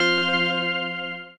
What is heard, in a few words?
autoplay off; Hottest Fruits 40